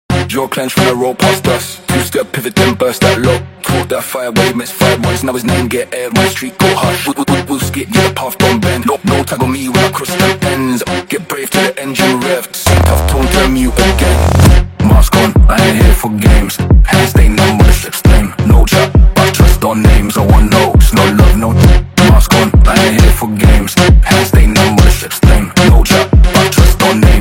Funk Sped Up

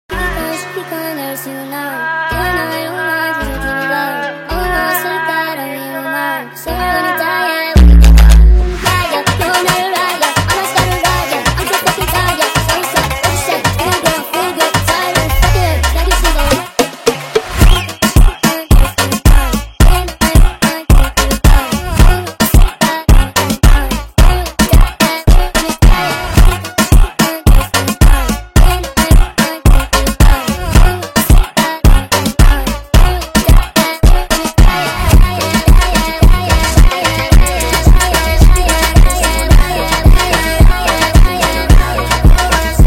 FUNK Sped Up

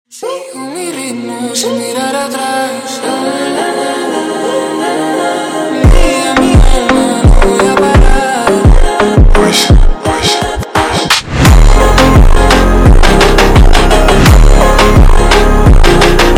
Funk slowed

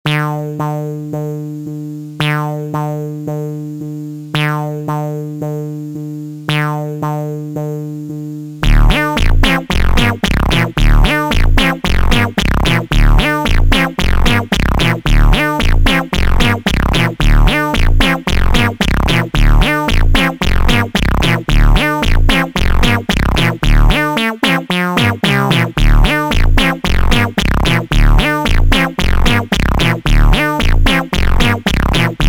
Meow Meow slowed

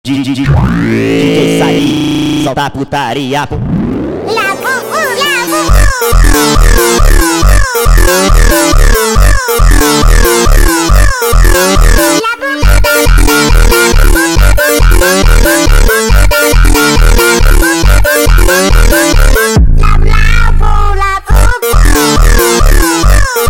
Funk sped Up

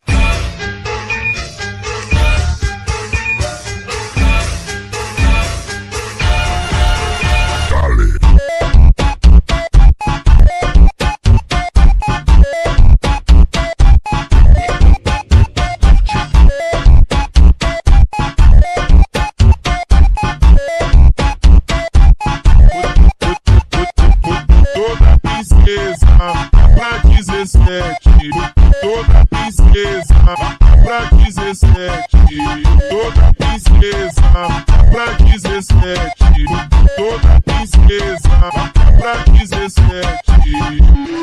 bassboost

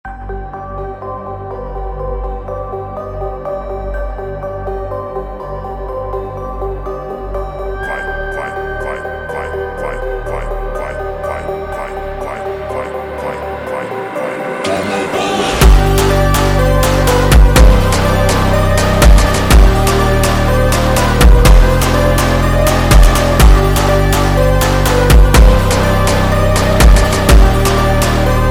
super Slowed

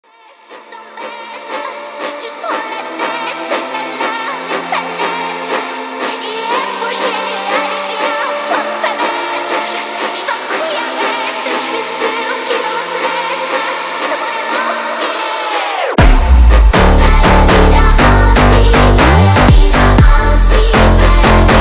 Phonk slowed

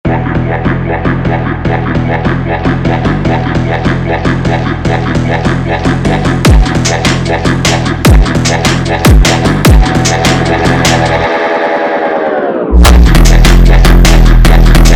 Bomba Funk Brazilian Phonk

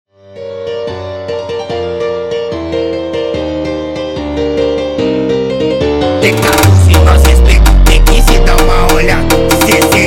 sped Up

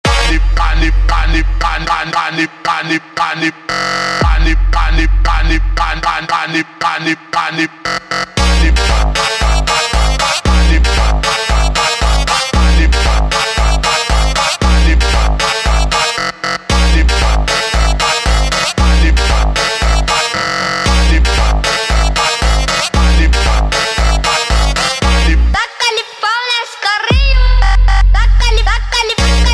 Phonk slowed slowed

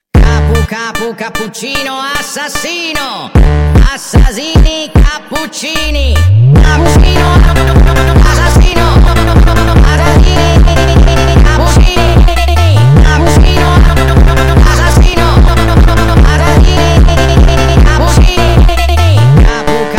Funk sped Up

